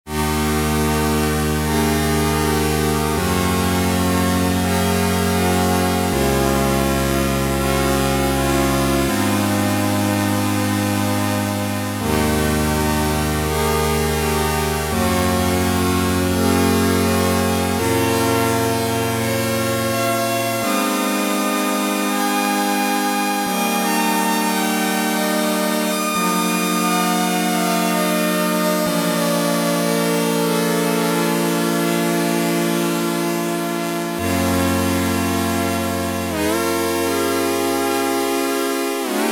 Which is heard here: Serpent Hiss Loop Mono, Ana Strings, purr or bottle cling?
Ana Strings